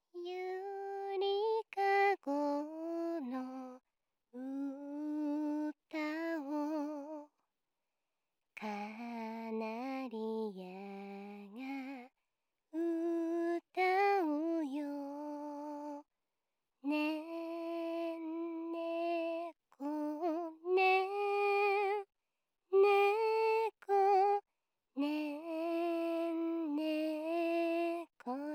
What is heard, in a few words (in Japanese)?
子守唄 歌ってあげます, ASMR